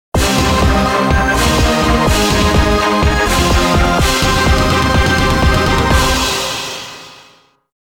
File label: fanfare easy